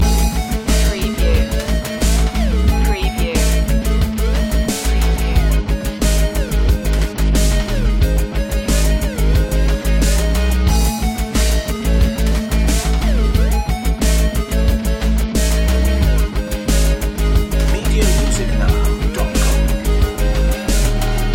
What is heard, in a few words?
land attack loop